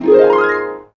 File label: g#1, Harp, MIRAGE; Retro Sampler; Harp Gliss + Solo